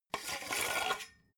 metal scaffold pole extend; industrie-wirtschaft

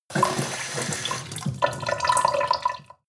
sink water pot medium

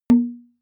hit plastic bowl low, percussion

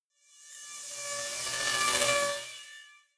industrial riser short

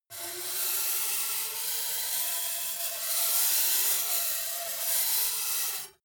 metal screech phaser steady long; metall

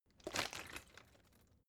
bottle water sparse shake single soft; berufe

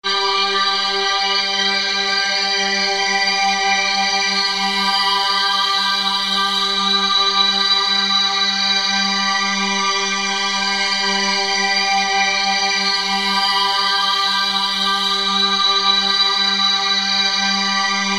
resonant flange sweep, drones

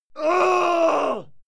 male fight scream pain; schreie; menschen; soundpool

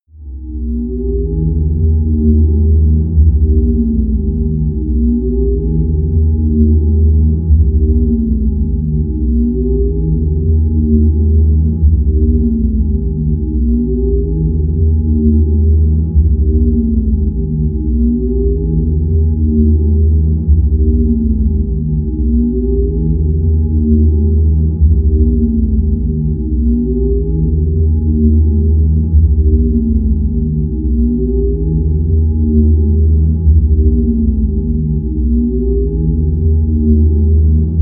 drone swirling

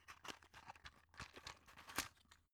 drug open shaky; arzt

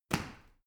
medicine ball catch, fitnessstudio

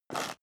step high heels concrete grit slide short; schritte; Footsteps; menschen

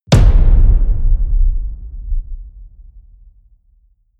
drum hit simple large

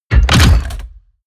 slam, tueren

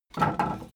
wood board handle roomy; objekte; holz

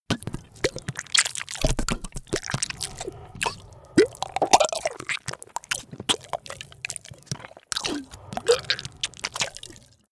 monster flesh eating; koerpergeraeusche; comedy-cartoon; soundpool

